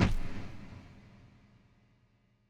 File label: eye close